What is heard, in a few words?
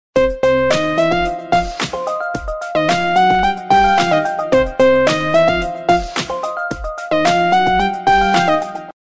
midi ring; LG VX9200 Env3; vzw